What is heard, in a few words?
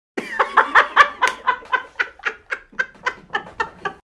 laugh; kamala harris